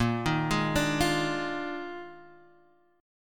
Eb Major 7th Suspended 2nd Suspended 4th Bb

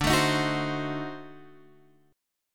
D 7th Flat 9th D down Guitar Half Step Down

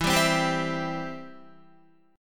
E Minor Sharp 5th Guitar; Em#5 strummed; JGuitar

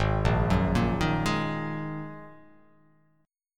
Ab 7th Suspended 2nd; Ab7sus2; 4,1,4,3,3,3,x,x; arpeggiated; chordshape; JGuitar

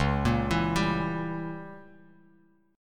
C sharp Diminished 7th C sharp down Bass Standard; C#dim7 arpeggiated; JGuitar

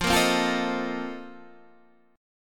A sharp Major 7th Suspended 2nd Suspended 4th

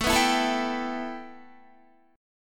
A 7th Suspended 2nd Guitar; A7sus2; strummed

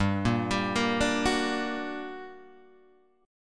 G Minor Major 7th, down, Guitar, Open G

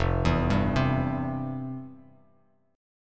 F Major 7th Suspended 2nd, Bass Standard; arpeggiated; JGuitar

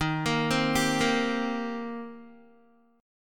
Eb 6th Eb down Guitar; arpeggiated

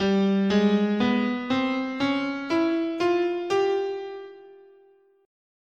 G Oriental, scale

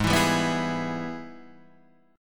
Ab Minor Major 7th Sharp 5th

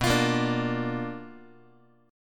B Minor Major 7th Flat 5th Guitar

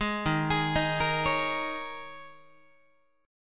Eb 7th Suspended 4th Eb, Guitar; Eb7sus4; arpeggiated

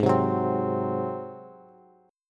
G sharp 7th, Bass; strummed; chord; JGuitar

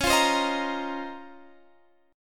Db Major 13th, Guitar Open F; strummed

